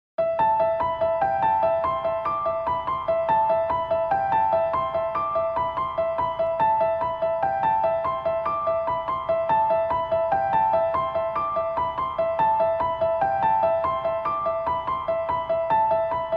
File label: Piano; El Exorcista; The Exorcist